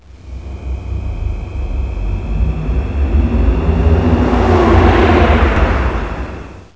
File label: fly by